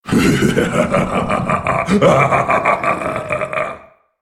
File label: laugh; Skarner